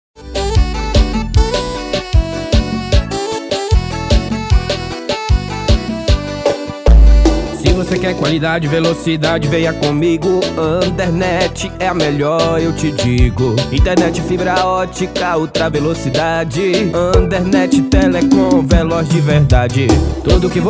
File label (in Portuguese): jingles para ineternet musica para provedor de internet